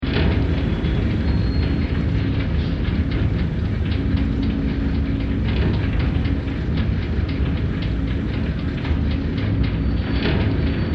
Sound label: Steampunk Engine, Steampunk, Engine, Loop; 5.1